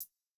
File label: hihat; Reference Beat BPM120; j city pop 1980